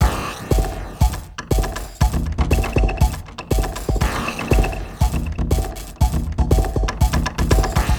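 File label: Loop, beats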